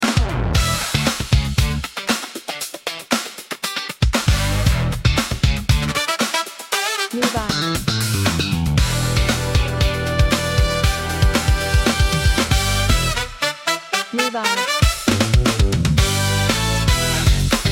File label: Electric Funk Ride